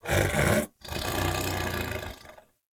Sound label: Pet Snore; Enemies; Warframe; Catbrow; PetShip